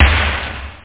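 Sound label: snare drum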